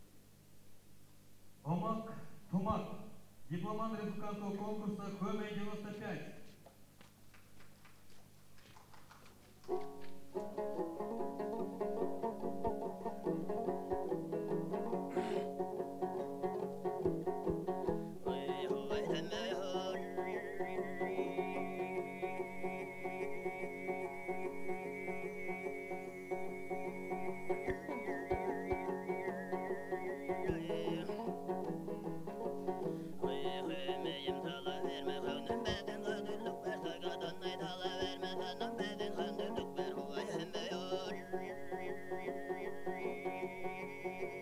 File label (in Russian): Хоомей; Тыва